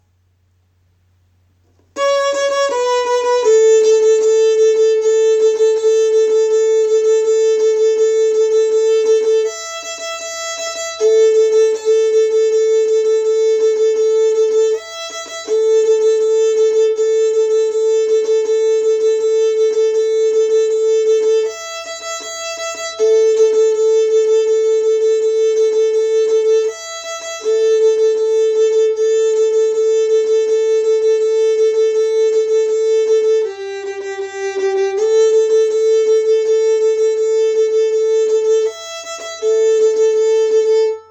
Old Joe Easy

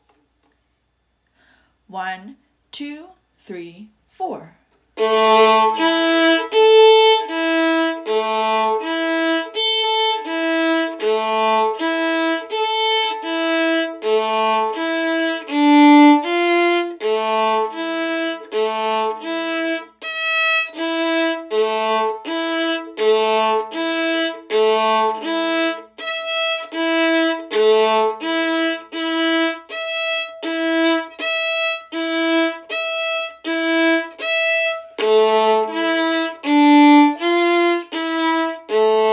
Oh Chanukah Harmony

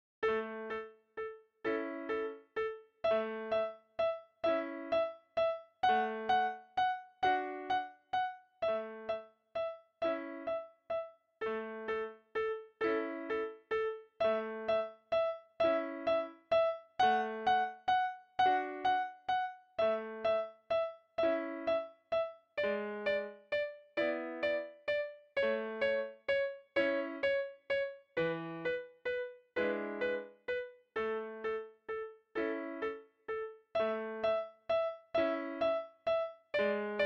Twinkle Var D

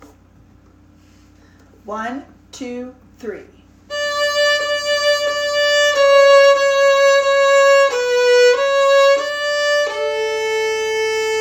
French Folk

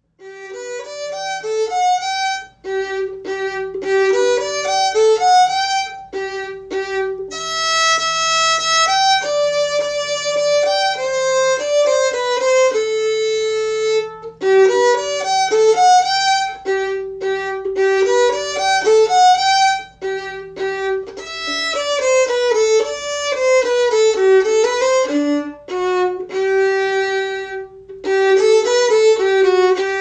Minuet

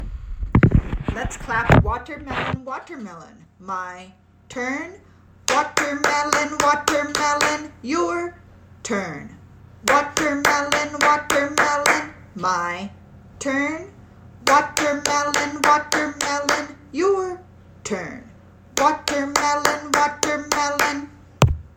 Clap

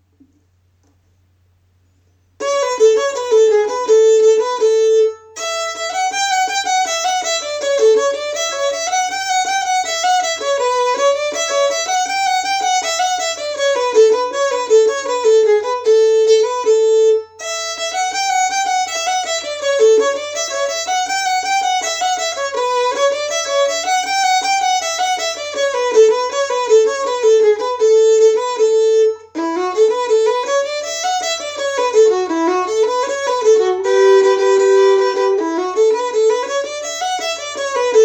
Old Joe Advanced

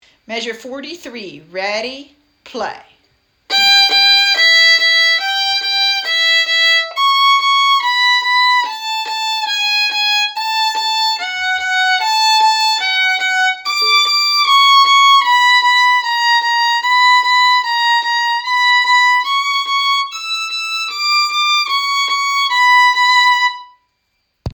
Perpetual Motion; Bohm

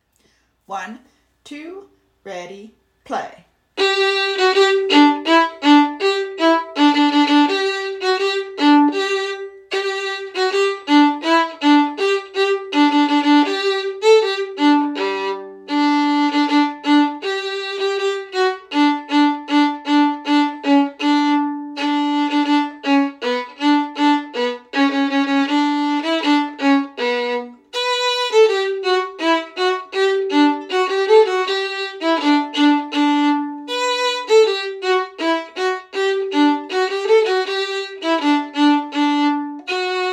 harmony; Deck the Halls

